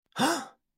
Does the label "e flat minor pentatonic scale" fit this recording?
No